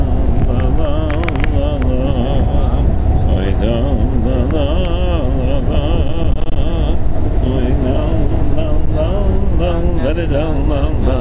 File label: niggun